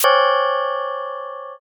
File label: sting